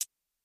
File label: button Up; warlords